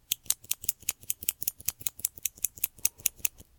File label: automatic pencil